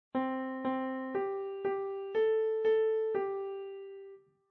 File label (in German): Gehoer Quinten Quarten